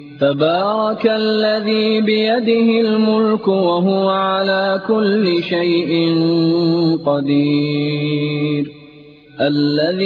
surah al mulk quranic

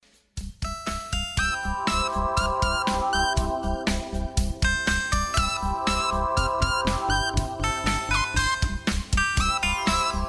happy moment phone ringtone

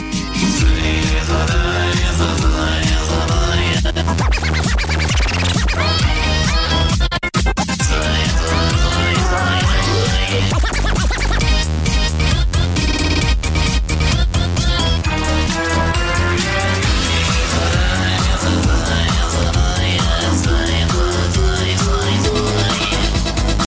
cartoon ringtone, funny ringtones

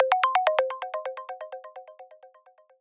battery critical

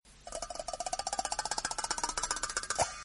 cool short funny tone ringtone; funny ringtones

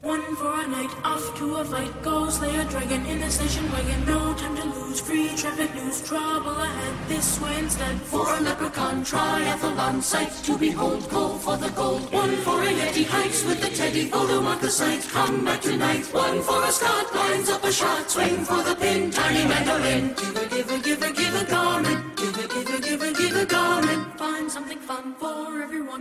tiny mandolin ringtone; garmin

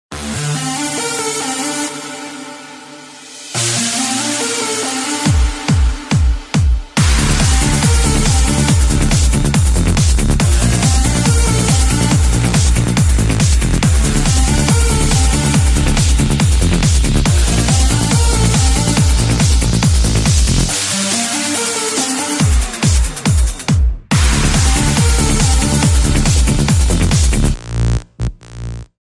trance ringtone

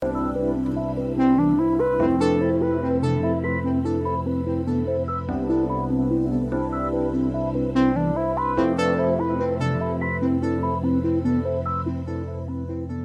sms ringtone

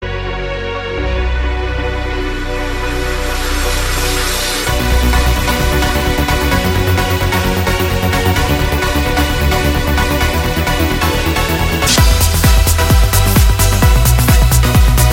down trance, ringtone

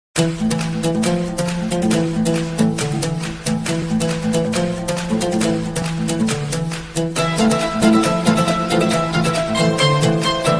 guitar ringtone

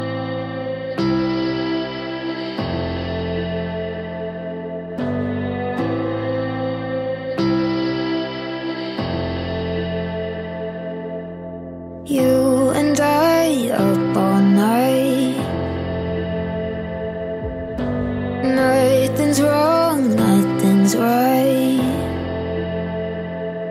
music ringtone, english

